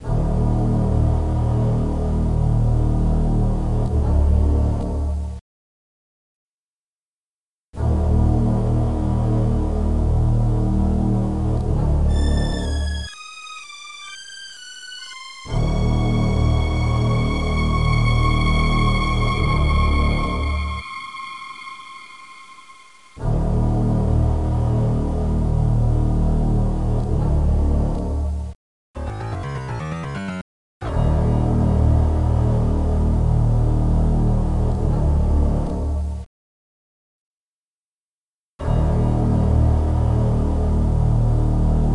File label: Music; Dungeon Master II; Macintosh